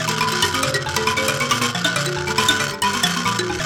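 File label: PLK Bass Mbira; Roland LCDP11 Africa VOL-1; M'biras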